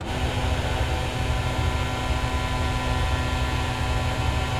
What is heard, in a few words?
Flaps Electric, Aircrafts; DCS